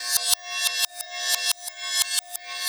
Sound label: Cymbol Particle; Particles